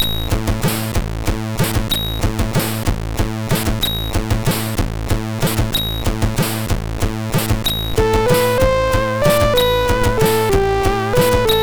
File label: Last Waltz, MOS6581R4